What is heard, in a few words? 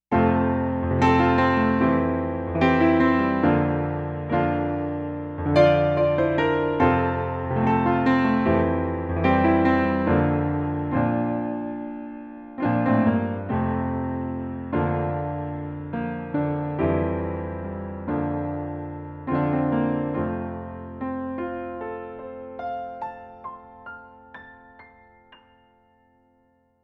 88 stage grand; juno-di